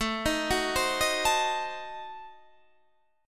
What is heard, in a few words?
A Diminished 7th A, Guitar Standard; Adim7 arpeggiated; JGuitar